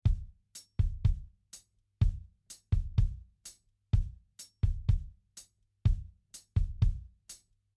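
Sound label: Simple drum groove bass drum hi-hat 124bpm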